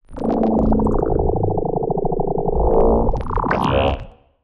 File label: Sci-fi Organic Vroom